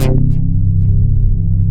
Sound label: BIG UPPS, SYNTHS; Pro-Rec - Synthsonic